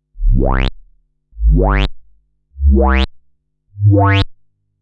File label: SIGNAL FLOW Reso Moog Model 15 iOS